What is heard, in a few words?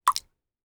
bubble pop